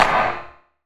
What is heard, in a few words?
Clap, Gated Reverb; creative gate; musicradar